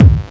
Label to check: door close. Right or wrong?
Wrong